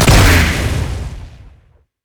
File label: grenade; rumble skin03